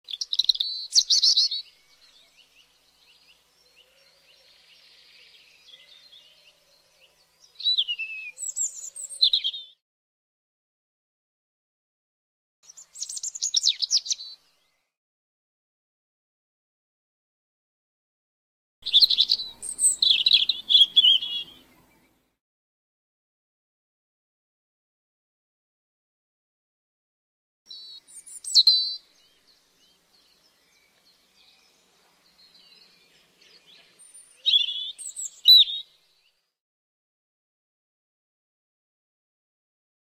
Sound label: nature robin set